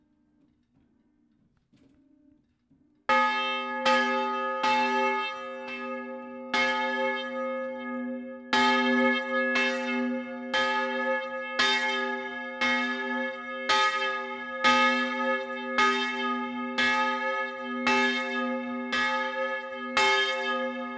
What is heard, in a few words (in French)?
Lausanne cloche; campanologie